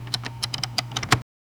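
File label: card getting reader machine; confirmation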